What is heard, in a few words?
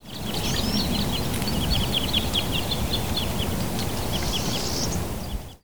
Pine Siskin; Genesee Mtn